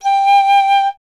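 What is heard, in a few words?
flute piccolo G; Miroslav Vitous - Solo Instruments VOL-2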